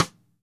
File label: Radio King Cross Stick; Sonic Foundry (Sony Creative Software); Vital Drums